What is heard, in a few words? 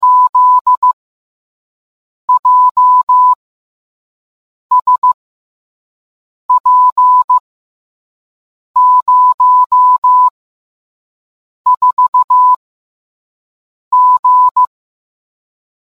morse